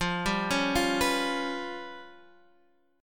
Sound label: G 7th Flat 5th